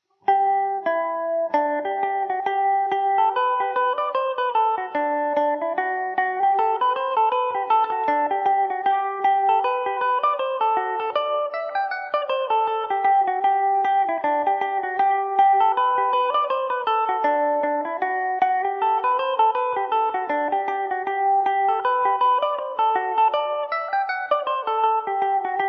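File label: G major, Irish mandolin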